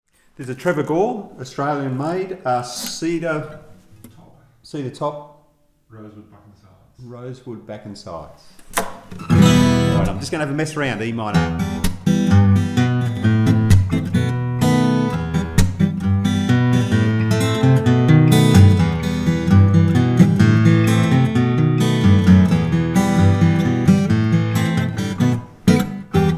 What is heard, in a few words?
steel string concert classical